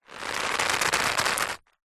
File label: foley rope old thick movement twist stress creak; zapsplat